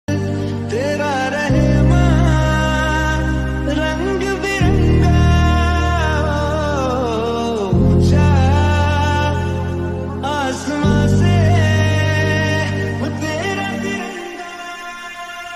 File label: indian army ringtone, army ringtone